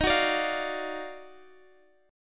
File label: D sharp Minor Major 9th D sharp down; strummed; chord